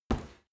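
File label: step soft stomping, footsteps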